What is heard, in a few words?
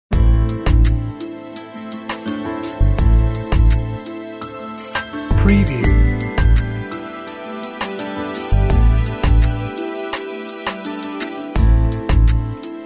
Chill Out